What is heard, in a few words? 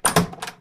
join game; RS Games Sounds; Apples to Apples